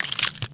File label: change accessory; Tony Hawk's Project 8; XBOX; bedroom